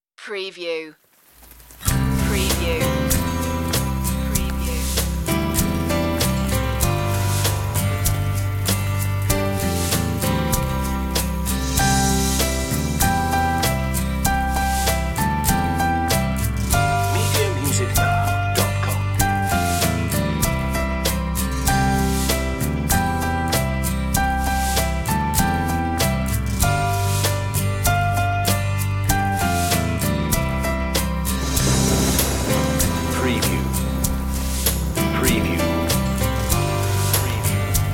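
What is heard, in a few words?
rain rain instrumental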